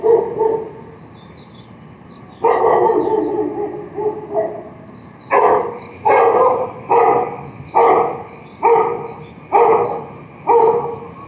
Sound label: bark samples